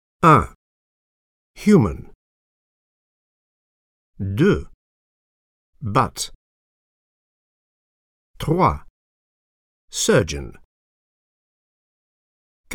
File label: techno